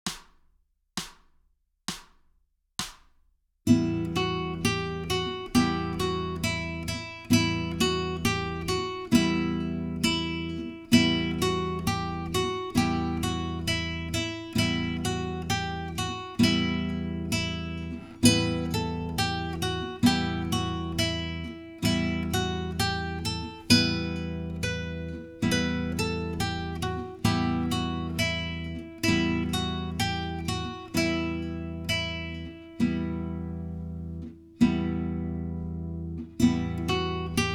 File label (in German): einfache Begleitung